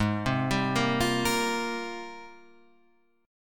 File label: G sharp Major 13th, Guitar Half Step Down